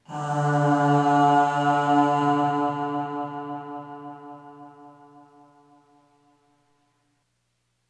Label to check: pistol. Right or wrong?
Wrong